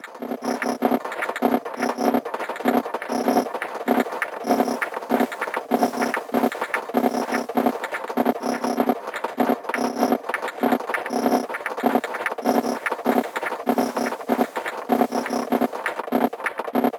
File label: 120 Noises Ambient Samples SNARES